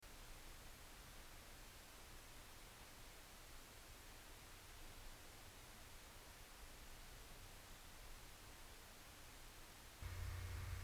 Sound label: wd green 2tb 1m